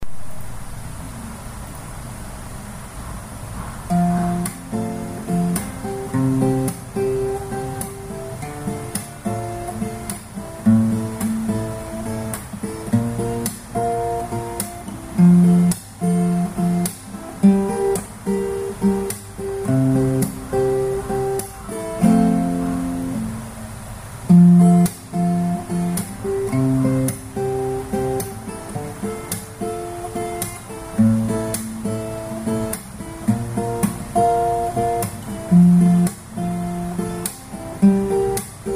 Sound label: guitar beat test